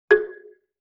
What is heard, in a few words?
Xylophone Chord G; Vintage Cartoon; Musical Elements